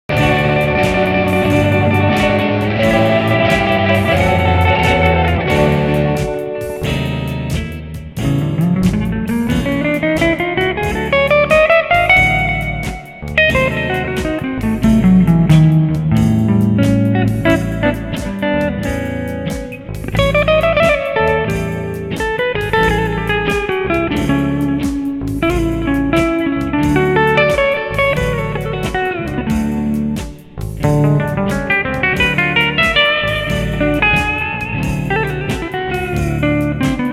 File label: Lick, Telecasters; Nashville